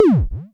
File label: PTCH HIT, Dual Percussion Synthesiser; Klone